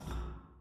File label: catwalk, Footsteps